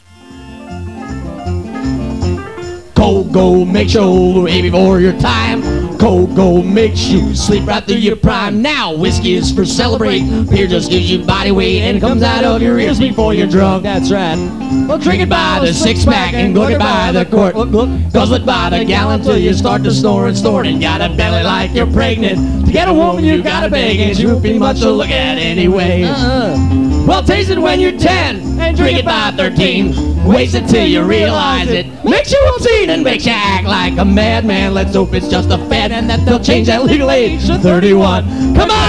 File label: beer song